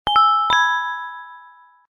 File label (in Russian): priyatnij zvukovoj signal